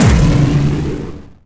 Pulse Cannon; Sid Meier's Civilization 4 Complete; Beyond the Sword; Final Frontier; Units

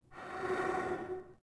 Gasmask Exhale; Sid Meier's Civilization 4 Complete; Beyond the Sword; Units

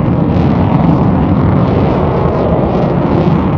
airplane jet idle; Hearts of Iron IV Death or Dishonor

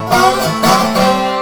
dobro danger

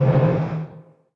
Transport Run Loop; Sid Meier's Civilization 4 Complete; Beyond the Sword; Final Frontier; Units

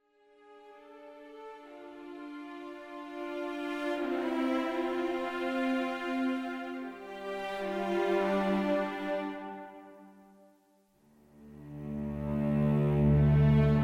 calm night; Mount&Blade Warband

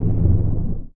Grave Run; Sid Meier's Civilization 4 Complete; Beyond the Sword; Units

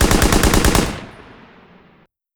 ita light armor mg attack; Hearts of Iron IV Death or Dishonor

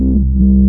combat scan loop, scanner; Garry's Mod; hl2

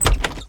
generic door open; doors; Team Fortress 2

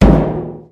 metal barrel impact soft; physics; Garry's Mod; hl2

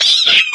pain, headcrab poison; Garry's Mod; hl2

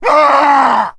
man death; Mount&Blade Warband

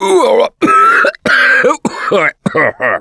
Cough; Gambler; russian

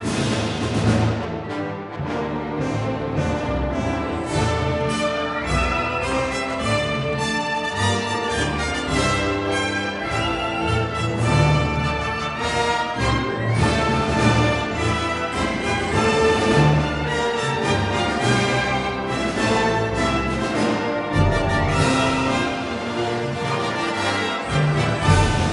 victorious neutral, music; Mount&Blade Warband

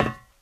metal, footsteps walk; Left 4 Dead 2

